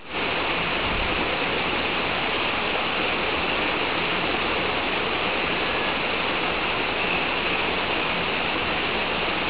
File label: falls